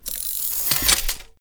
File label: Sticky Bomb Reload Ready; Grineer; Veilbreaker